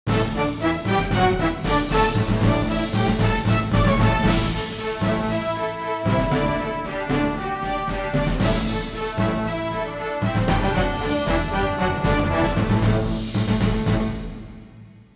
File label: march